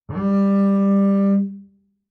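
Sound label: Bass arco fortissimo sul A G3, Double Bass; MIS Pitches - 2014; Strings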